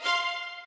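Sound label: violin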